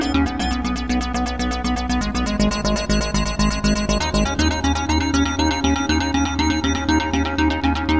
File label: AMBIE, Rythme